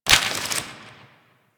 Grimoire Mech, Weapons; Warframe; Tenno

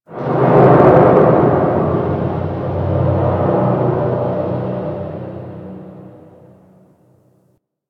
Ocean Low Creak; Ambience; Warframe; Grineer Ocean

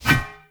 Shield Whoosh Short; Weapons; Enemy; Shields; Sinecure Shield

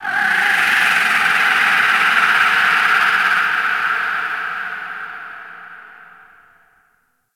Ocean Underwater Creatures Small; Warframe; Grineer Ocean

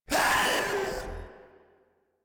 Pain; Proto Infested; Warframe; Infested Ninety Nine

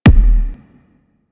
Grenade Punch Common One; Warframe; Venus; Venus Grenades; Venus Grenade Punch Layers

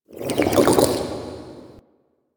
interior gurgle slurps; Ambience; Misc Indoor; DE; Warframe; Infested Eris

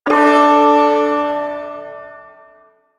Ersatz Shawzin Chromatic Chord; Music; Chords

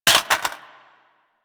Reload Drop Mag Default, Weapons; Warframe; Boar

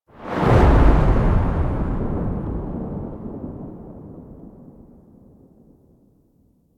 Select Menu Open, UI; Warframe; New Player Intro

